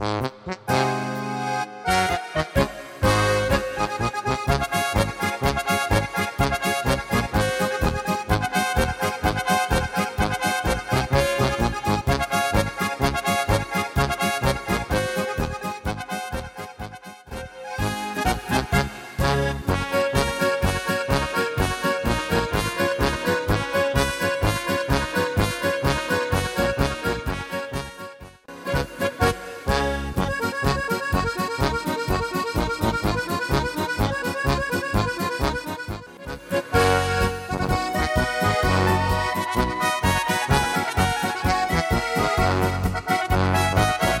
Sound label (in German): Trompetenecho